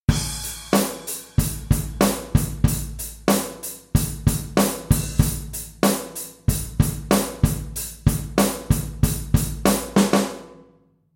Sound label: drum